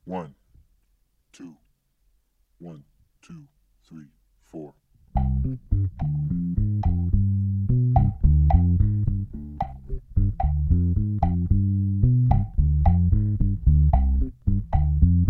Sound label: bass mambo and son montuno guajira 2 3 or 3 2